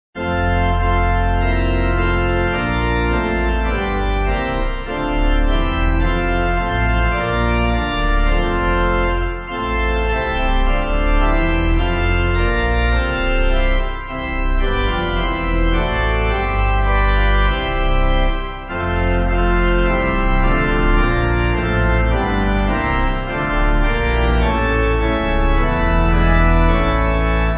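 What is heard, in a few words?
Pipe, Pipe Organ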